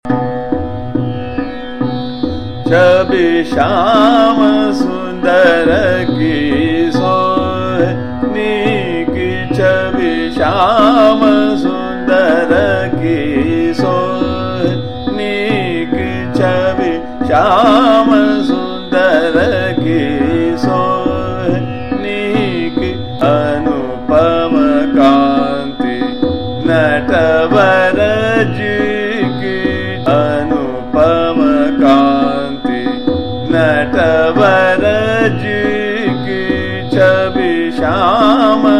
Chota Khyal - Trital, Bheempalasi

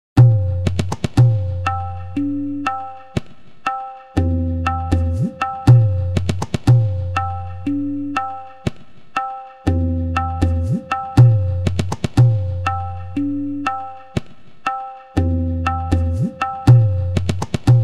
chartal ki savari, tala

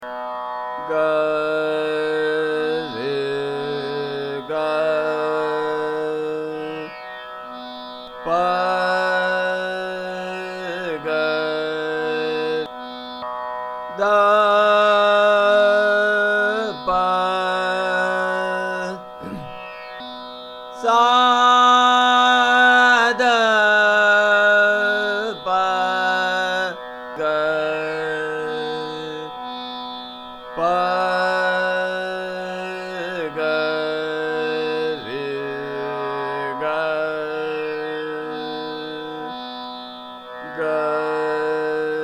Bhoopali Mukhya Ang

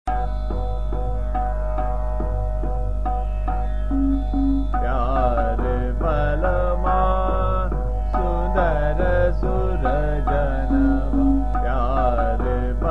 Chota Khyal - Trital, Multani; Pyare Balama Sundar Surjanwa

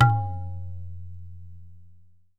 dha, tabla

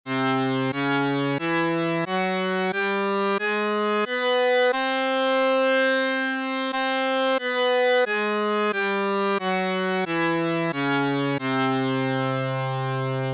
purvi, hindustani thaat

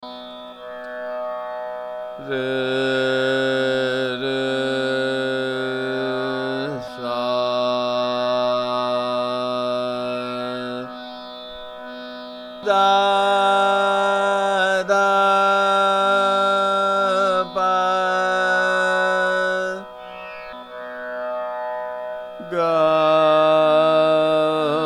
Bhairav Mukhya Ang

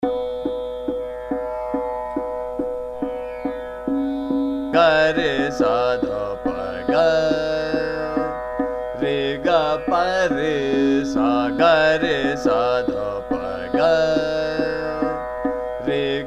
Sargam, Ga Re Sa Dha Pa Ga, Trital; Shuddha Kalyan

